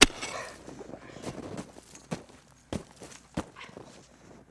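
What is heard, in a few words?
Dig Single Exterior Snow, shovel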